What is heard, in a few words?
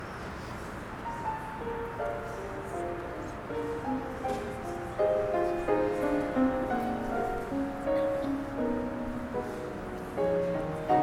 jr chorus birdsong